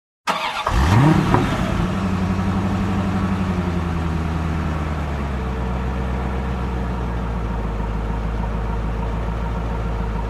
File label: Corvette Startaway S, chevy corvette